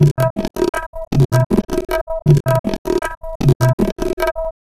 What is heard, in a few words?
tabla, 105 bpm; Zero-G; Pure Tabla